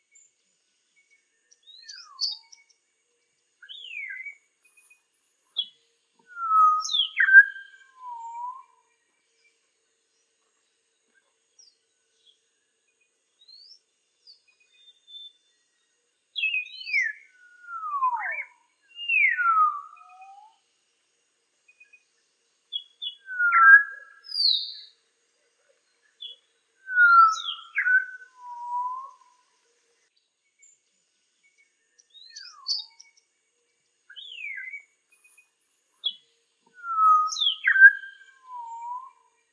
Canto do Papagaio do congo

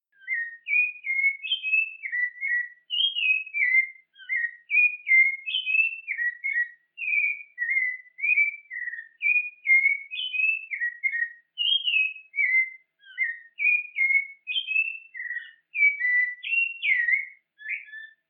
Canto de Sabia laranjeira

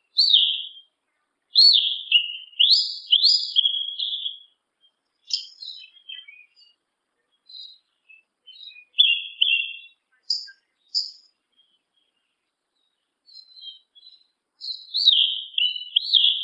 Canto do Sabia Una

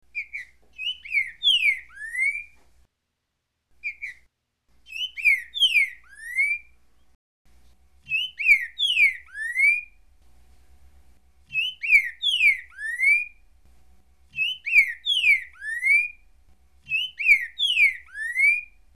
Canto Trinca Ferro Currucutil Boi

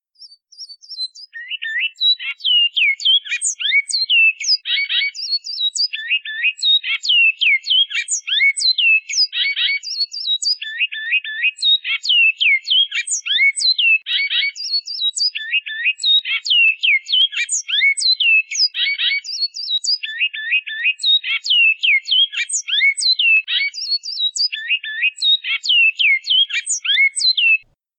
Canto Bicudo Canto Flauta

